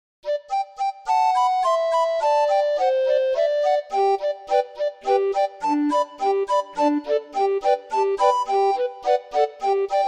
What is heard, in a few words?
Yankee doodle, orgue barbarie